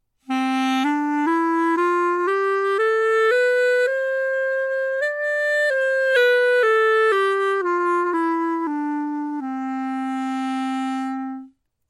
chalumeau tonleiter

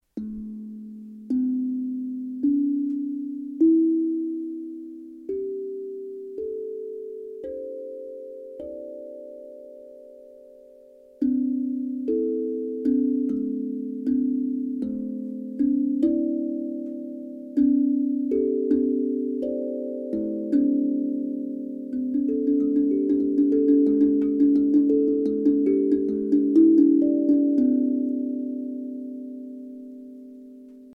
hapi a moll

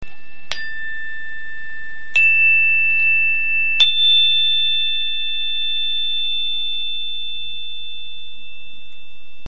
klangstab

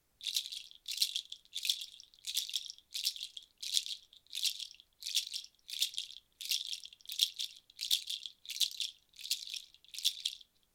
nussrasseln chacha

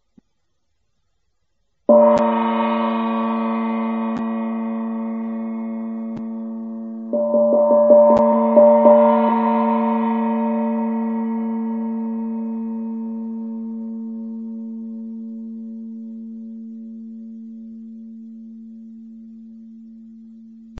tamtam

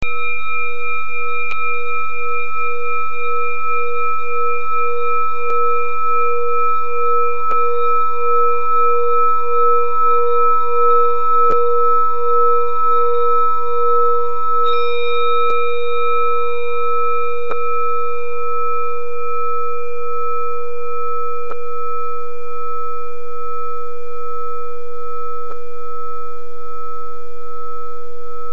gerieben

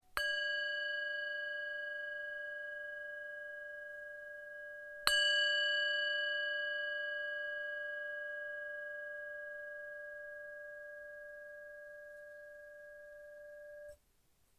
klangschale

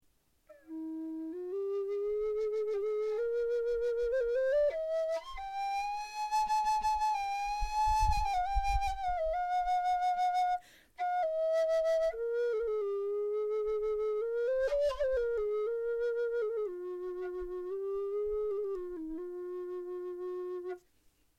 schilfblock e

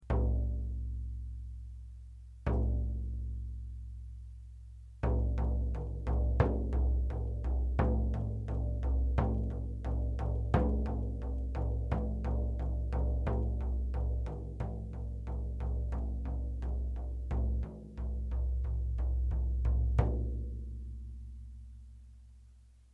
gongtrommel

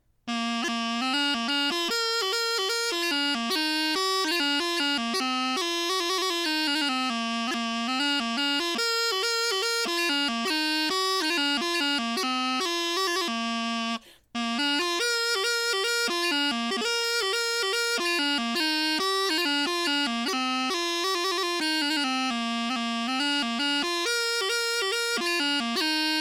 chanter melodie

